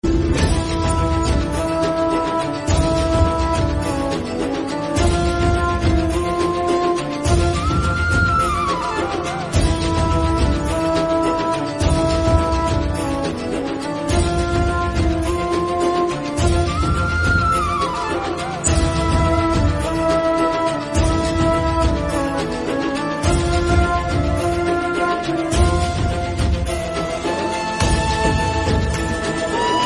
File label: Radha Krishna Flute Ringtone